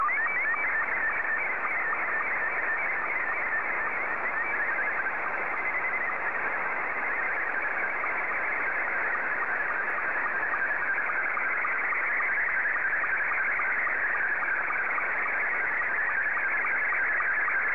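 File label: Scottie 2, slow-scan television; JL1FQZ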